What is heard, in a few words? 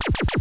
fire; davidson